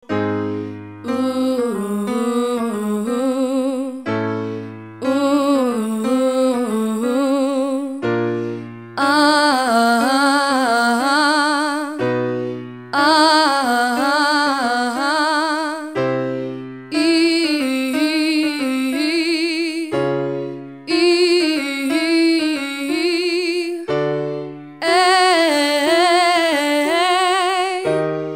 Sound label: major to minor bluesy